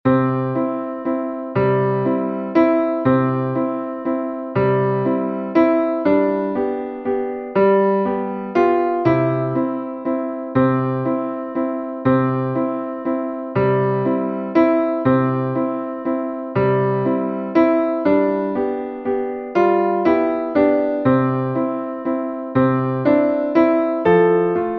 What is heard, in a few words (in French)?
Valse